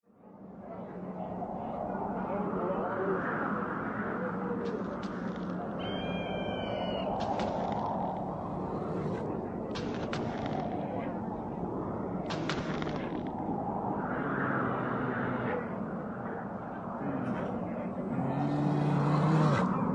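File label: sounds fairytale forest; zvuki izdavaemye medvedem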